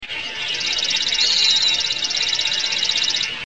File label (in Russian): shebet vorobya na fone strojki